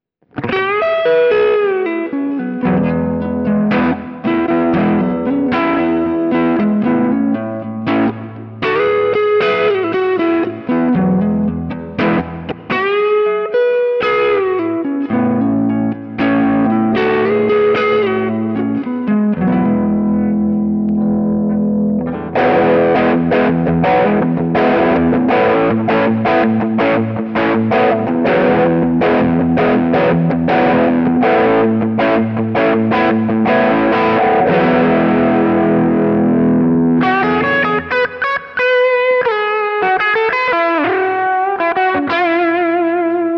Spruce Goose Overdrive Lift 0 alle Regler 12 Uhr

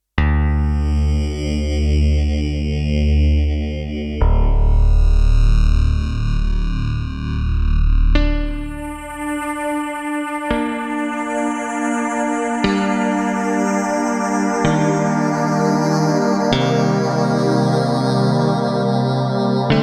Emax Zusammengesetztes Sample

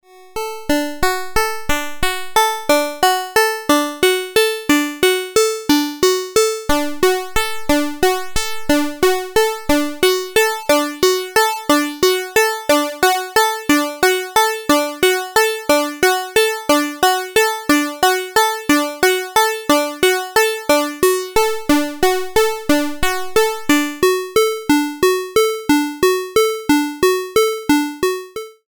Buzzy Ozillatormodelle Pulse; Freds Lab

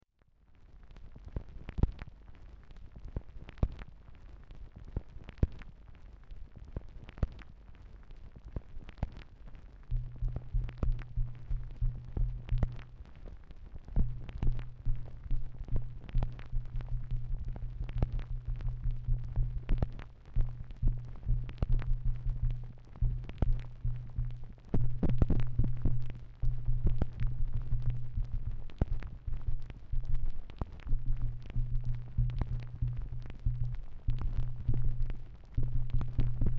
Klopftest Stanton STR8.150 M2

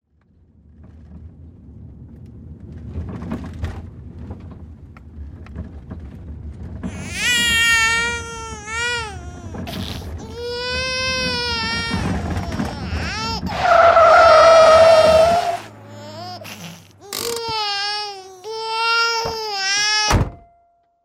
Baby bleibt im Auto

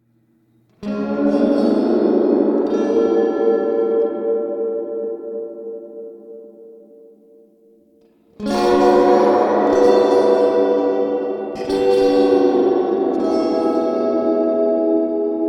Vermona ReTubeVerb Doppelte Hallfahne

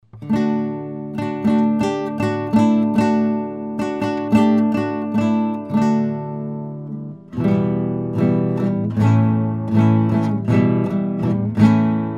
NT1 5th USB Gitarre extrem uebersteuert bereinigt

